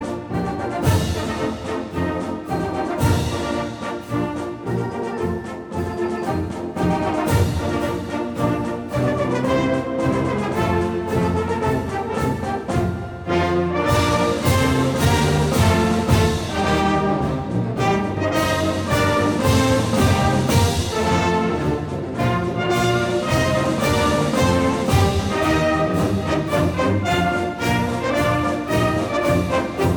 Trompetterkorps Huzarenmars

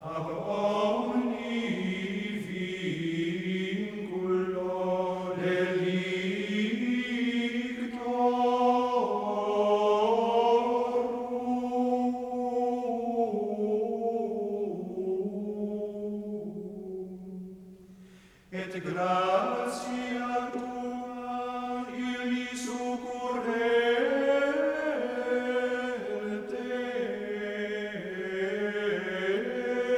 Tractus Absolve ( Gregoriaans ); Kyriale

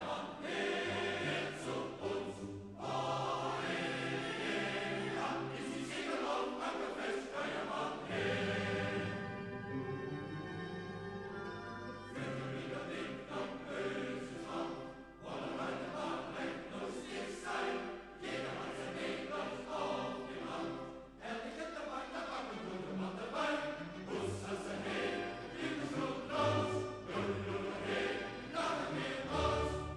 Koor mannen Matrozenkoor; Mastreechter Staar; uit de vliegende Hollander

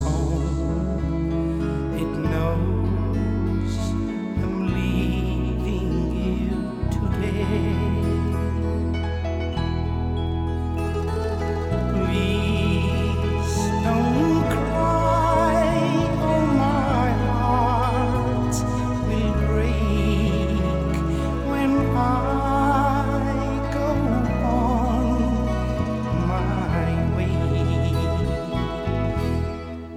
applaus

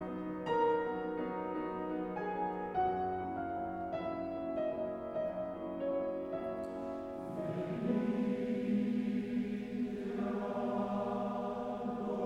Koor (mannen) Monnikenkoor

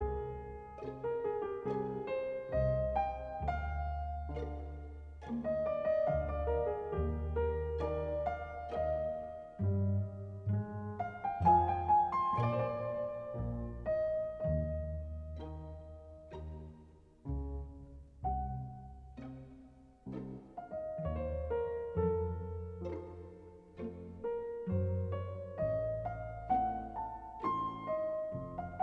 Pianoconcert in F Minor

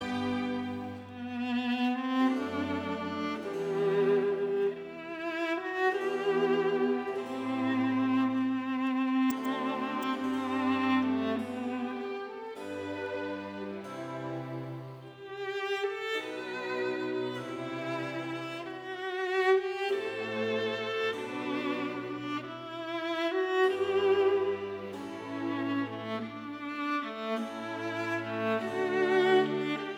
Vioolconcert in G Major Largo; Telemann

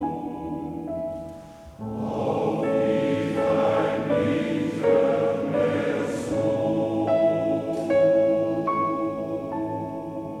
Koor; mastreechter staar

